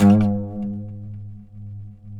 PLK OUD; Roland - LA Composer vol. 3

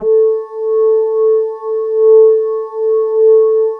XCELENT FL

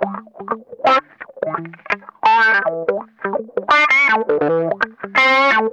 TIMER, Funk Guitar; Zero G - Funk Guitar

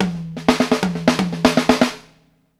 HIGHTOMS; Sampleheads; New York City Drumworks VOL-1; KD FILLS 120

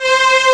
Faerie Horn; SNES; Dragon Quest 5

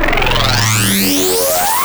High, Wavetables; Antidote Advent

